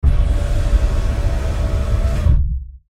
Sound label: Sci Fi Glass Shield Close Door; Doors